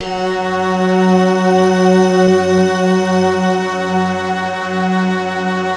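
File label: F#3, JAPAN FLUTE; Best Service; Advanced Media Trax Modern Composer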